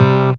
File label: Trancers, Dance Synths; Best Service ProSamples vol.37